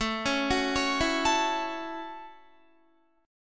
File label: A 6th, Guitar, chord; A6 arpeggiated; 17,16,16,18,16,16